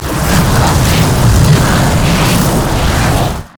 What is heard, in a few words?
frost scorched earth; LoL; shyvana